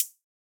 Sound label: Closed Hat; Cymatics; Future Bass Starter Pack; Drums - One Shots; Cymbals; Heater